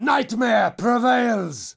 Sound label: Curse Vocal; NPC; Dominus Combat